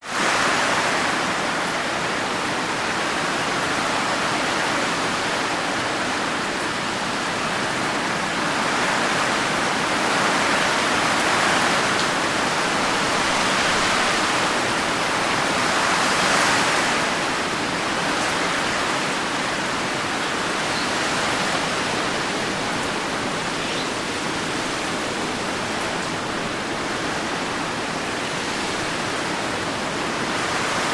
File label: nature rain heavy blown by strong winds sub tropical